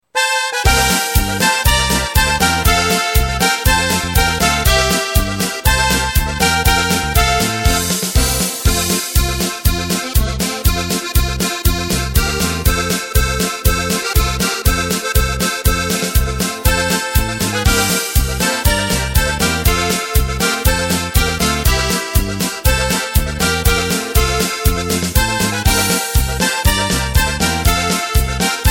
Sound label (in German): DRUMS Playback; midifile